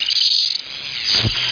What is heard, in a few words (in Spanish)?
bicho; Grounch